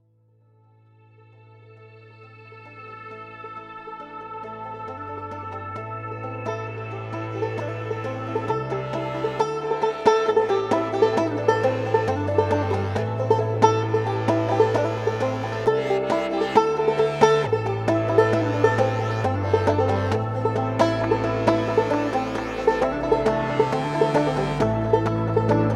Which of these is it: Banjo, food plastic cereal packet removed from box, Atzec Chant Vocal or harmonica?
Banjo